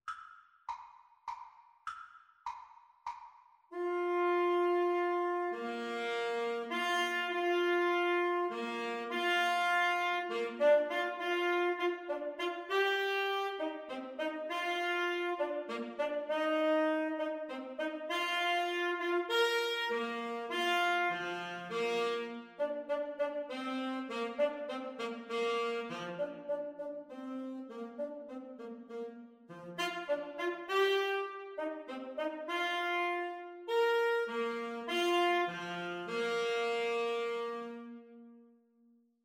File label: water music, Clarinet Tenor Saxophone